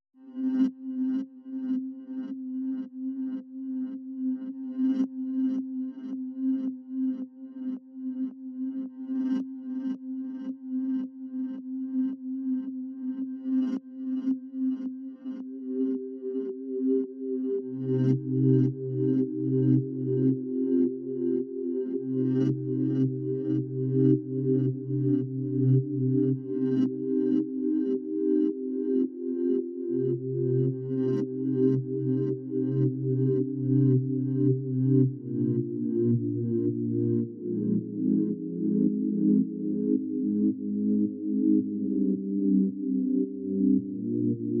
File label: Pad